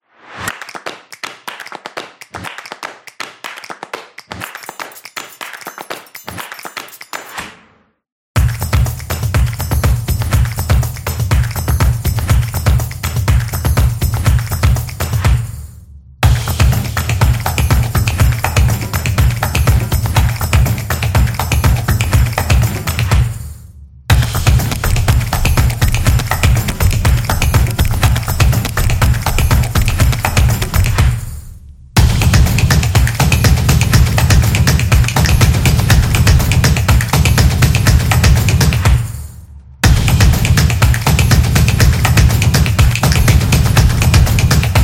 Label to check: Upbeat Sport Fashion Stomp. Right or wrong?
Right